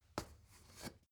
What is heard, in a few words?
step ladder wood single; schritte